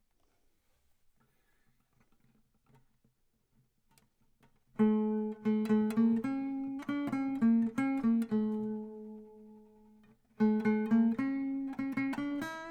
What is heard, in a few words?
single notes; Isana, probably De Luxe Model; Isana, probably De Luxe Model, seen on the flea market at St. Pauli today